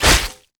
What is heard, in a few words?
Axe cloth, Damage